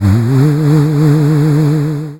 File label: elephant sound